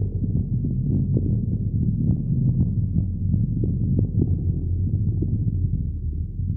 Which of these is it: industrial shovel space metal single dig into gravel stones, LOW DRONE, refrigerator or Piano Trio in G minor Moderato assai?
LOW DRONE